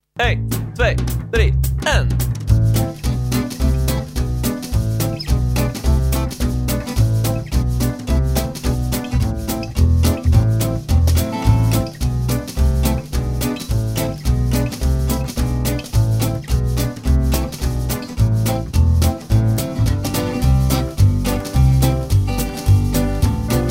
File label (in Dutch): Klarinet Instrumentaal